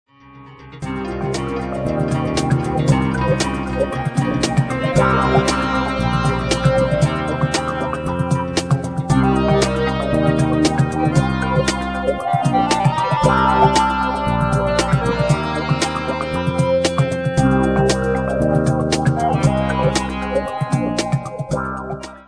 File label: chill dive, music only